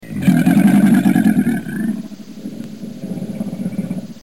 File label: Dragon